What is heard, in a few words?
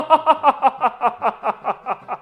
hahahahahaha